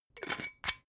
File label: glass bottle massive close fast